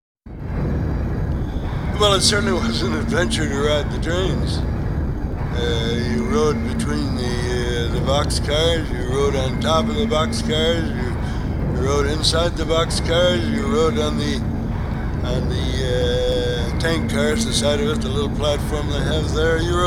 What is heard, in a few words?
train loop